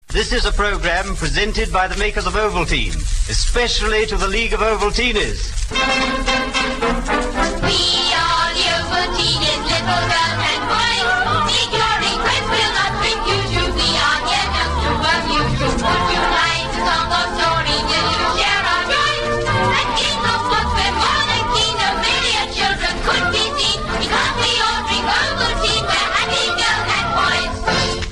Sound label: jingle; R Luxembourg; Ovaltinies